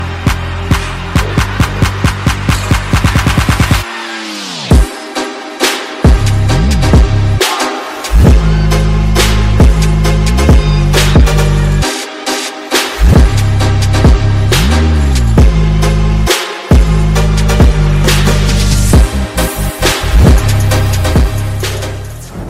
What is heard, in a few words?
epic beat drop ringtone